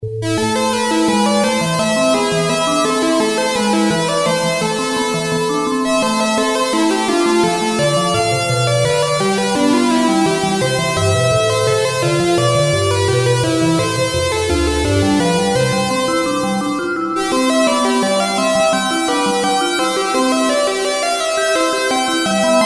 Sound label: voice; Thomas Henry